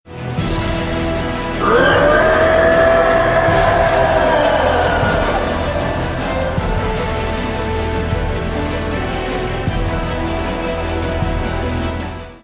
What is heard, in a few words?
the ultimate roar